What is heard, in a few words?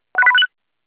Business Blip, Business Rings